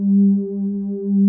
ANAPAD G2, ANALOG PAD; Music Store - Claudius Diemeter Schwerlos

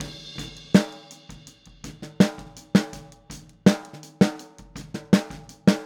Boogaloo, Snare, 82 BPM; Drums; Verse; Sonic Foundry; Drums from the Big Room The Mixes

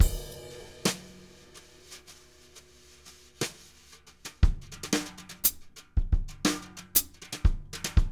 jazz brush loops

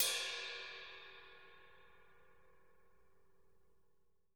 ride

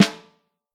Snare Drum; Q Up Arts; Johnny.C's Vintage Snare Drum; 6X14

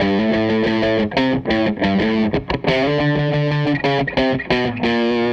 Guitar Loop; Sonic Foundry; Six-String Orchestra

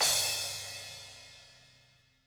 Cymbal, Latin Percussion; Sonic Foundry; Joe Vitale Latin Percussion

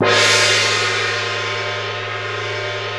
Tam Tam; Proteus 2000; Multisamples; Digital Sound Factory Proteus 2000

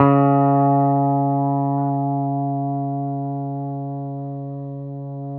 PS F C#3, PEDSTL NOTES; Sampleheads; Whole Lotta Country

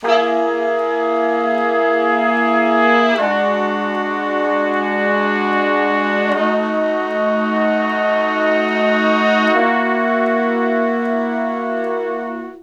Slo Blues Chords [V, IV, I, V] (B); Sections; Sonic Foundry (Sony Creative Software); Horncraft for R&B

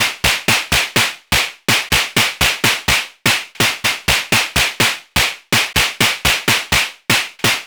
Clap, Electro Shock; EDM; Sonic Foundry; Afterhours EDM

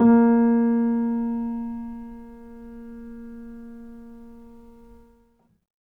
R, Acoustic Piano, PIANO STEREO; InVision Interactive; Miroslav Vitous

